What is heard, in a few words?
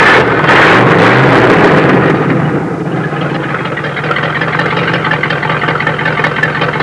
HOT ROD; Transportation